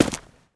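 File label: player dirt, footsteps; csgom; cstrike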